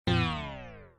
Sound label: rank down; csgo; levels ranks